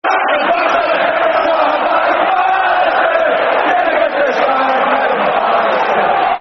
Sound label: Sad Mackem Bastard, Football Chant (Soccer Song); Newcastle United Nufc